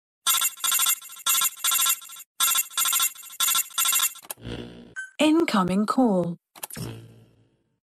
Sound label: Incoming Call; MgsV